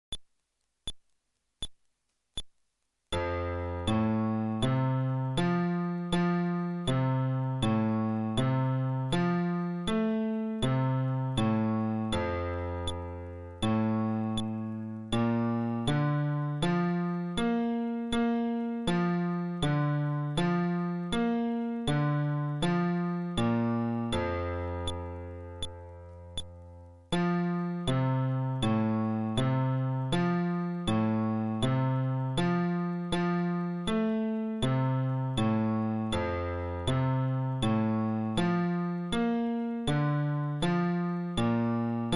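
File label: Arpeggio テンポ80, フレットレス bass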